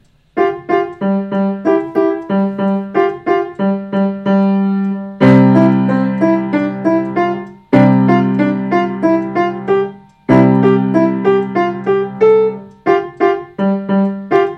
fourth grade band

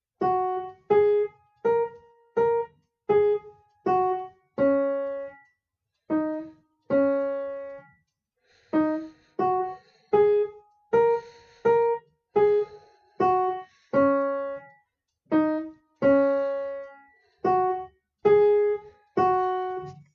waltz; carousel

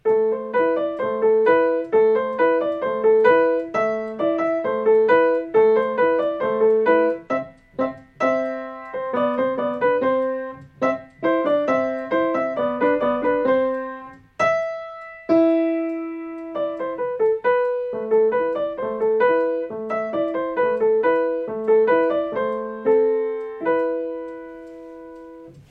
folk dance, piano

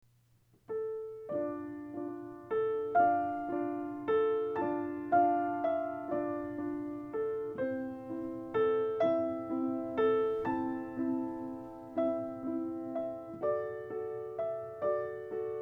waltz

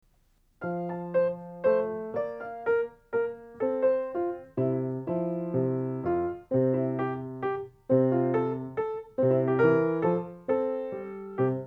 minuet f major, piano

